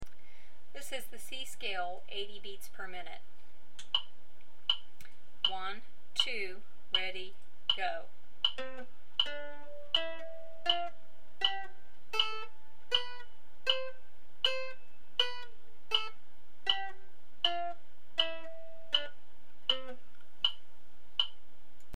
C Scale 80bpm, mandolin

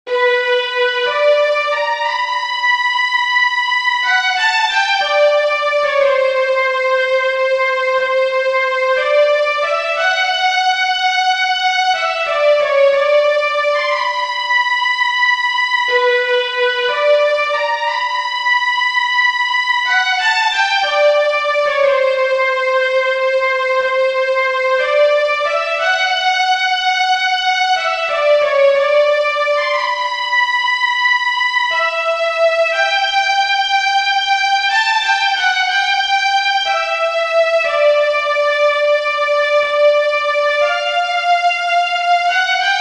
Melody 90bpm, mandolin

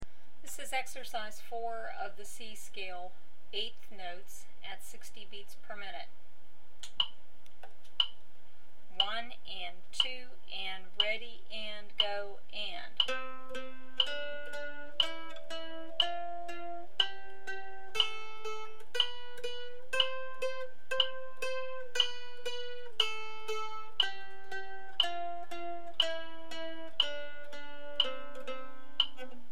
C Scale 8th Notes 60bpm, mandolin

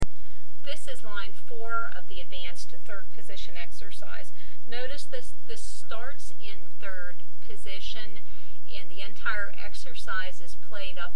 Advanced 3rd Position Exercise, mandolin

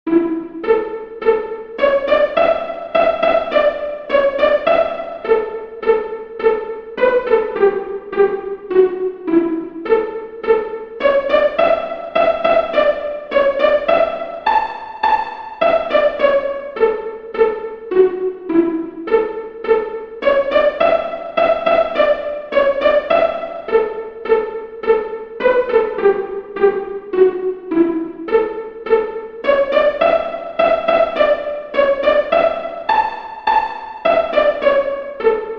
Basic Melody 100bpm, mandolin

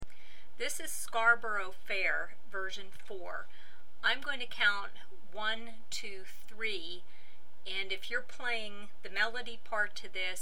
Scarborough Fair 66bpm, mandolin

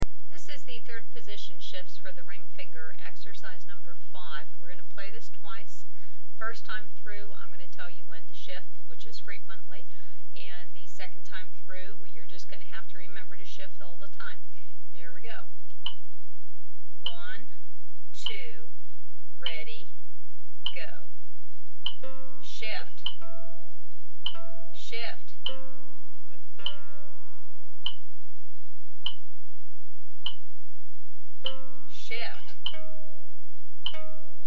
Third Position Shifts Ring Finger, mandolin

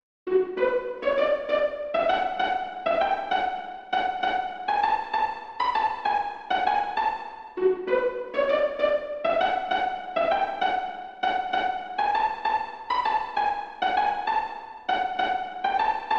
Romance Mandolin 100bpm

